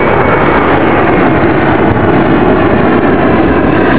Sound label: Boeing737